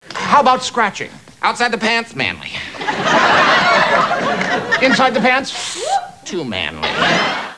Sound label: scratch